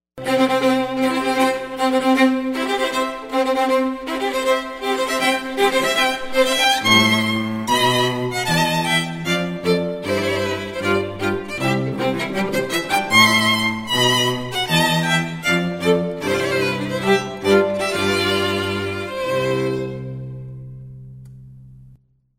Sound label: Wedding March, Strings Trio